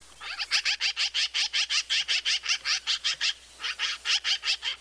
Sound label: A MAGPIE